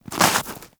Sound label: Armadillo Move Snow; Warframe; Characters; Hunting Critters